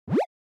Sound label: Anime Motion Low